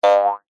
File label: cartoon bounce spring jaw harp